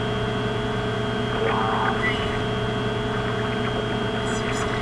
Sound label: sec room computer; Dystopia